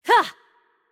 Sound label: Attack Light; VO; bloom minigame